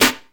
smooth acoustic snare sample c key, Royality free snare one shot tuned to the C note; 90s